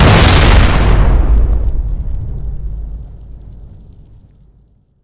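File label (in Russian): мощный взрыв разорвавшейся бомбы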